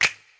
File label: Snares, Clap n Snap